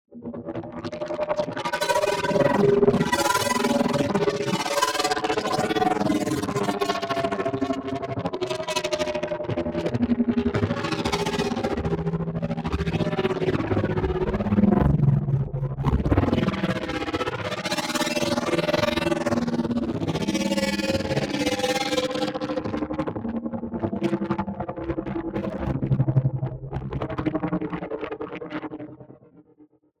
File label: MOVEMENT Warp Gate; NEON; boom library